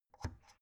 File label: oven knob turn soft